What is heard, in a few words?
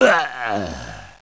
DIE; Caesar III